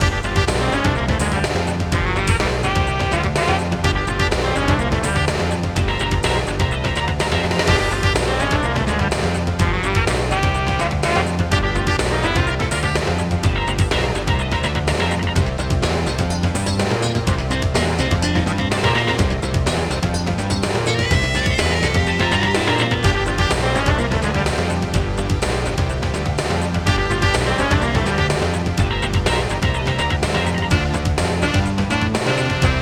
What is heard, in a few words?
Tennis - Clay Court; Super Monkey Ball 2